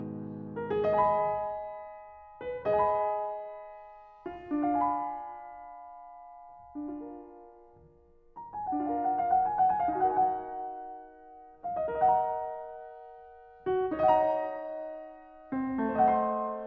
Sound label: prelude in e flat minor; j.s. bach; the well tempered clavier book i; prelude no 8; bwv 853